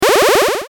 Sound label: powerup